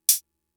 Open Hat, Drum Machines MFB-522; Fricke

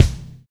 Reg. Kick; Roland BK-5

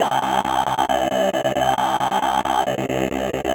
Kaossilator gated (130BPM); musicradar

